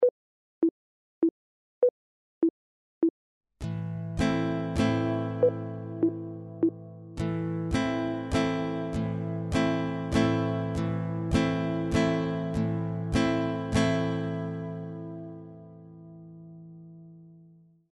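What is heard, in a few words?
chord, guitar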